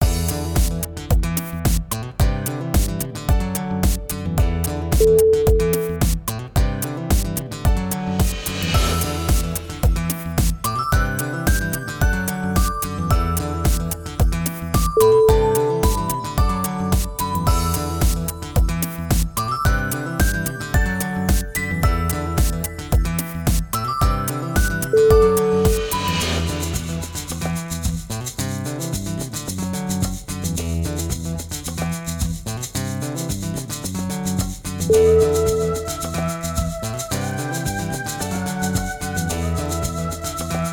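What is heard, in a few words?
funky highway, music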